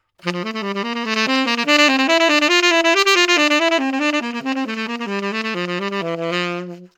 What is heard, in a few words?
Tonguing; SYOS